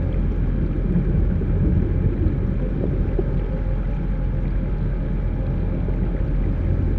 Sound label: colossus muzzle; aquatics